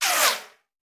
Bullet Pass By; Sound Ideas; Guns

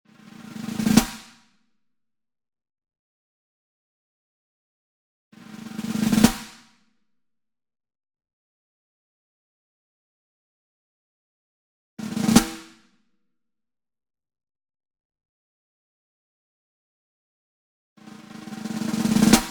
SINGLE SNARE Brady Jarrah Block Roll Near; boom library; Cinematic Strikes Construction Kit

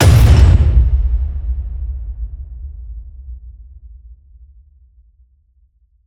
HIT Slam, Cinematic Hits Designed

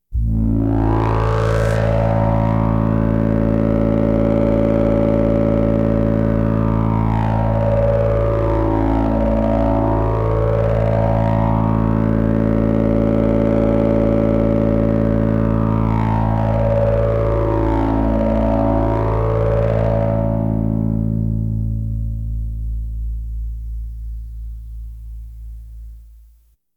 Science Fiction effects, Lasers, Light beams

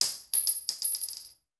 Bullet Shell; Sound Ideas; Guns

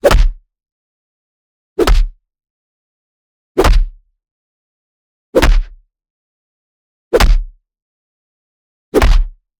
PUNCH Short And Tight; boom library; Anime Essentials

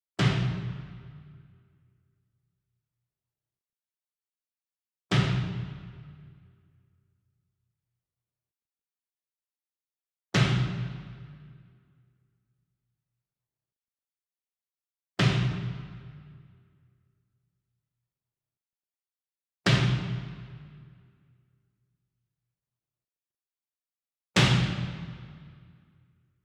Bass Drum Marching Hit Hard Beater Far; SINGLE DRUM; boom library; Cinematic Strikes Construction Kit

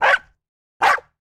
Dog Barking; 1001 Sound Effects

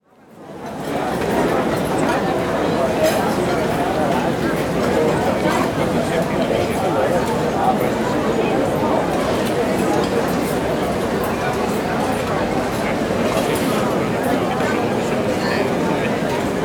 Restaurant Large Outdoor Patio, Walla; Sound Ideas; Walla Series

